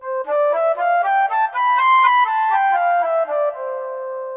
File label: flute